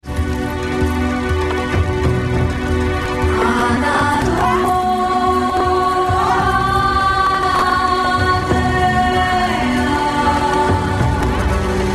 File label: end song